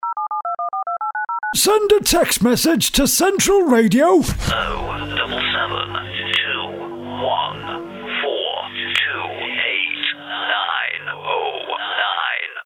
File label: Radio Telephone number JINGLE; Central Radio